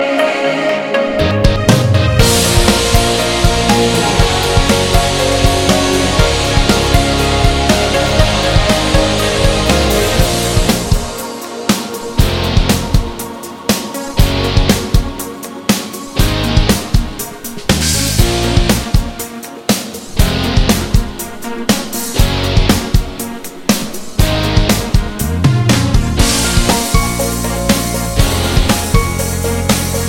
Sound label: INSTRUMENTAL MIX